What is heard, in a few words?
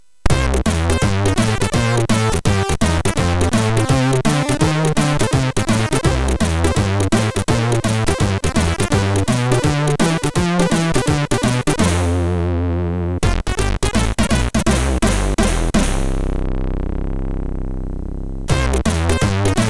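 jazz jingle, sid MOS6581R4; MOS6581R3; hvsc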